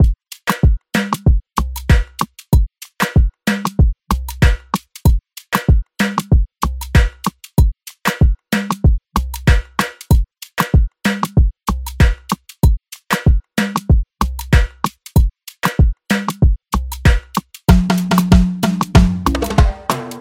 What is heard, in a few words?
95 bpm modern afrobeat drumloop; pasadena